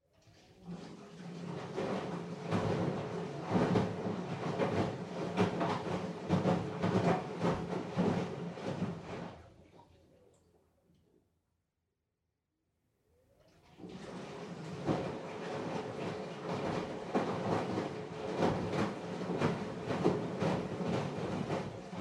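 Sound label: Washer Front Load Operation Noise Far Wash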